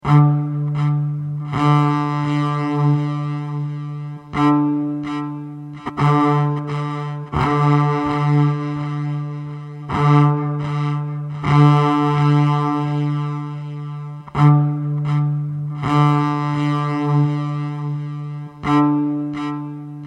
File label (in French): bass